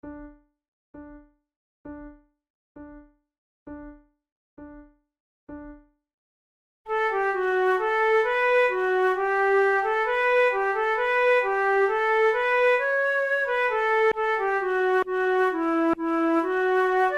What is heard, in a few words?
duet, flute